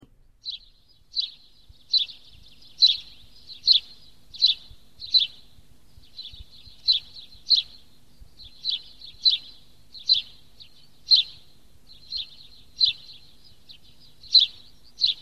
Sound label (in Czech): zvuk, ptaci